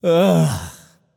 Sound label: ugh; smite; odin